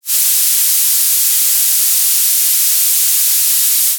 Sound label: Noise Violet